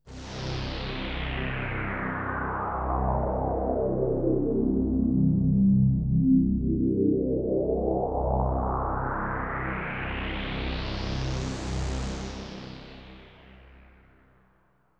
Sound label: synth pad ambient 160 C; Sphere; eJay